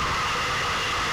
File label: Noise distorted